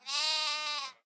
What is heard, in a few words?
Lamb Idle